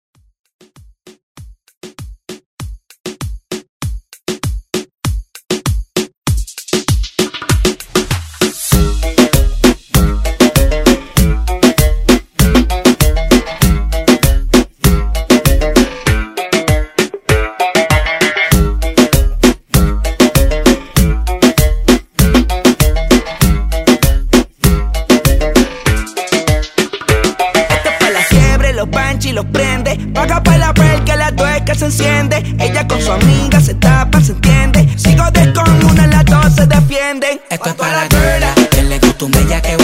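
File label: Reggaeton Club Redrum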